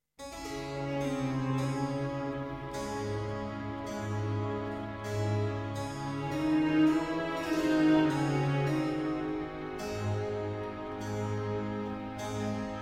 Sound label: Concerto in E Major for Violin and Strings; Concerto No. 2